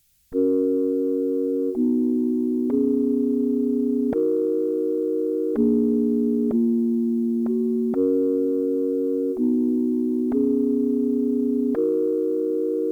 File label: Chords (126 BPM)